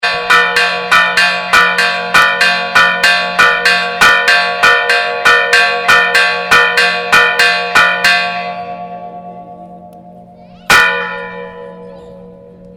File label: plaza mayor, villa de leyva